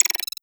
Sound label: vidlink call; world misc